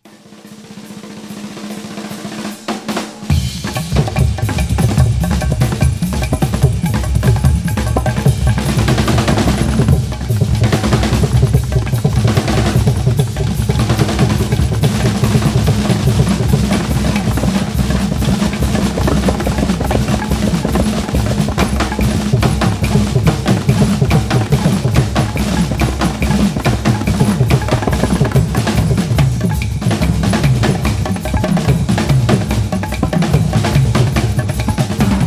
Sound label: oriental wind